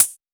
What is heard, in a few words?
trebly pedal hi hat sample d sharp key